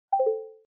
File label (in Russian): zvonok zavershon